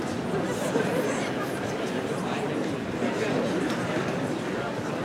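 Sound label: crowd discuss inside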